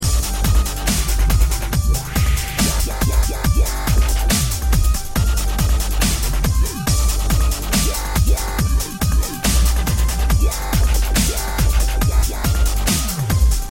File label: dubstep; bhop